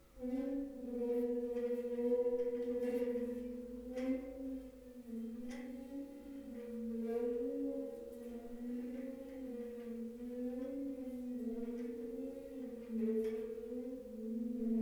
Rubber Mallet klein Notenständer

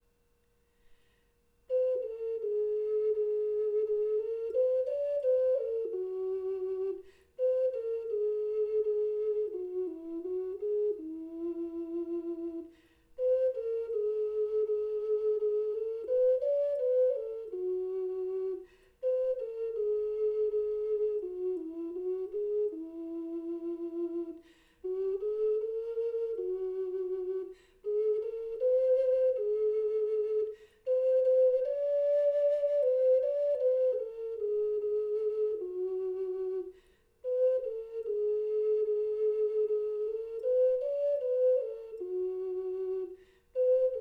Okarina; Guter Mond Du gehst so stille; MozartOkarina

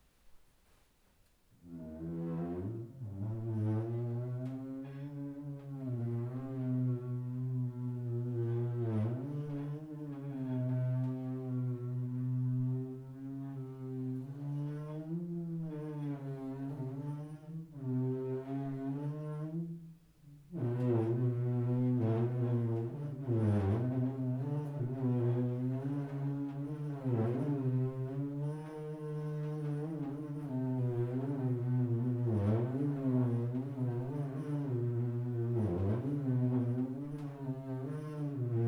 Rubber Mallet groß Tischplatte Bierzeltgarnitur

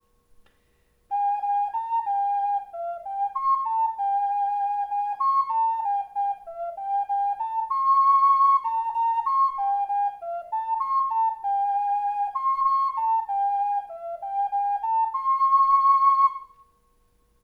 Okarina; Vogel; Rotter; MuHaBuch 65; Auf dem Segelschiff; Stennes-Falter

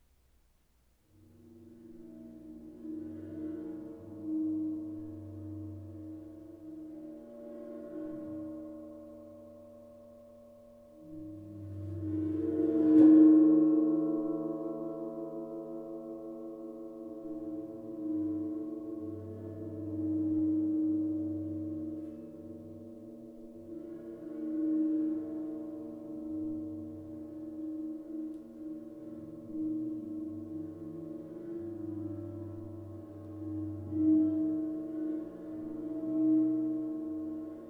Rubber Mallet groß Gong

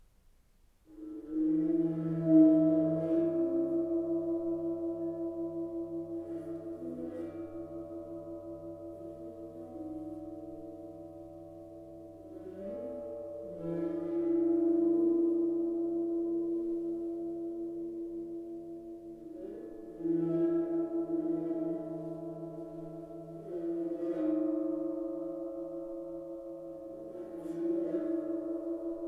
Rubber Mallet mittel Gong

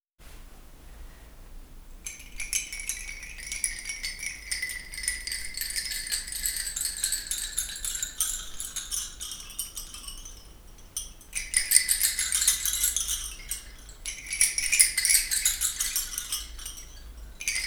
Ahornchimes Klangprobe

